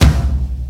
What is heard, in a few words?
clicky kickdrum sample c sharp key